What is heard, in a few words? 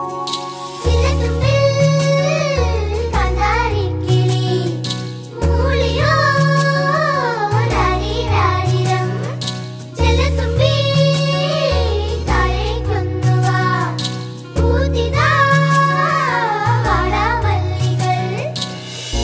humming ringtone